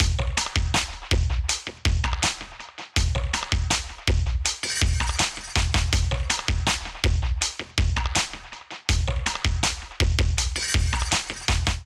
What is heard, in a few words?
Animals Kit 81 bpm, Drums; MIDI Clips; Drive and Glow; Ableton; Factory Packs